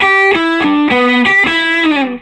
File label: SOLO E, Vintage Blues Guitar